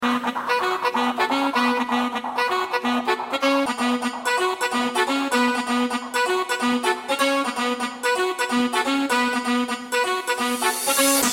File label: Electro Accordion